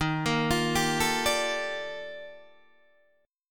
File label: Eb Major Sharp 11th Eb Guitar DADGAD